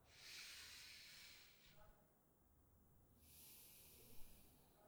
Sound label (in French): Soupir; Index des soupirs; Hadjithomas Joreige